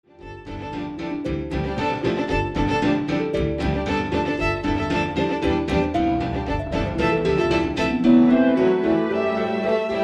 hysteria lullabye; cue

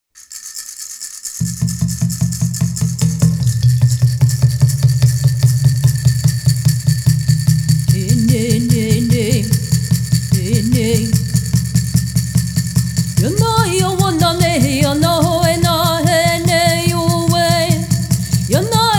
Song With Water Drum and Gourd

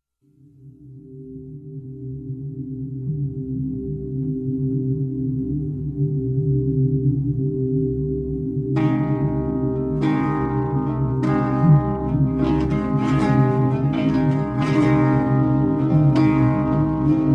mariachi

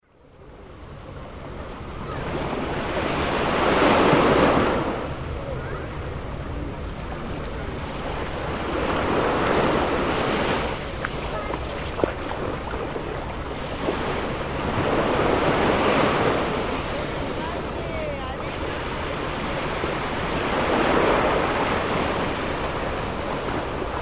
Barcelona Soundscape; Barcelona Songs

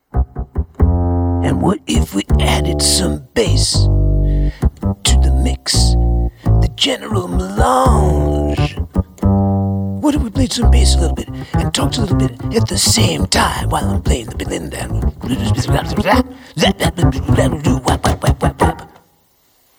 Bass Test

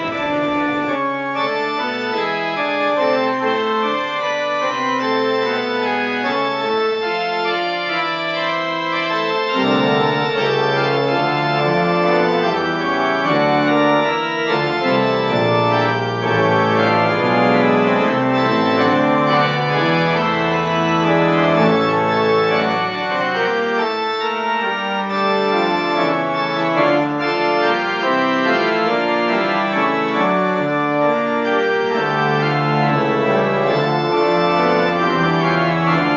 Postlude

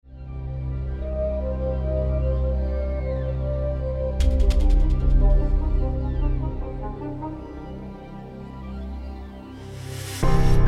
Thrill Atmosphere

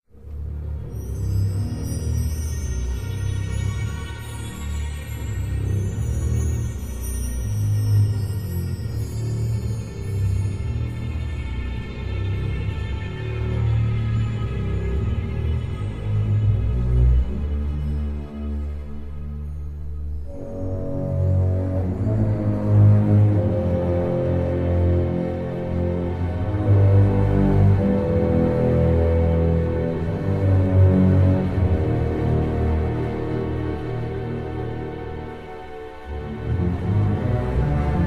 Magical Spooky, Video Game Soundtrack; Harry Potter and the Goblet of Fire

